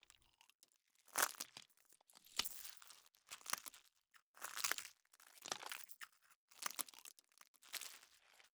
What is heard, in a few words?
pro ship colossus working looping; ships; biogenesis